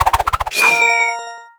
Character Attack; NYC

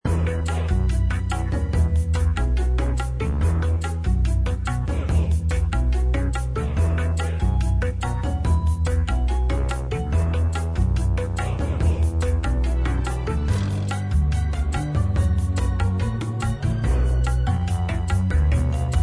Cave Music

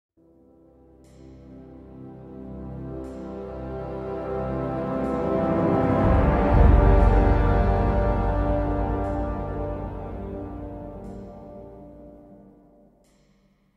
Suspense Theme; The Amazing Spider-Man; PS Vita, PS3, Windows, Xbox 360

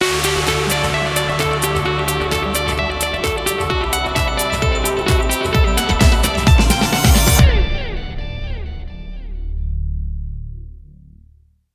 Level up; Gran Turismo 6

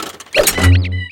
Boss Attack

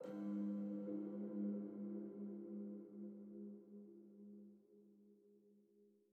Env Field Night Cave

